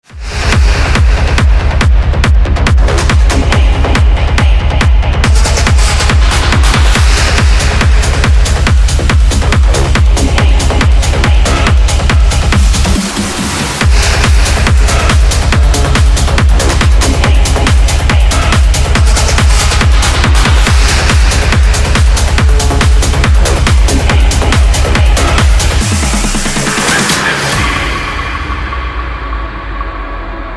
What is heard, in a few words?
techno; signal